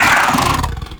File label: Combat Vocal Attack Medium; Warframe; Catbrow